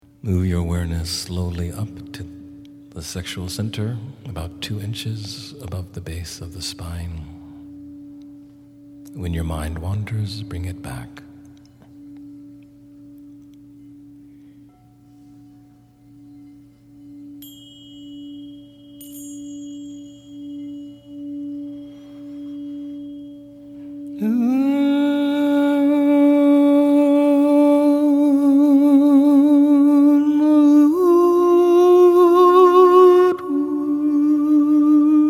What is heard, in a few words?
2nd Chakra Sound Exploration